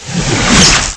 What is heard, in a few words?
teleport in; Ferazel's Wand Demo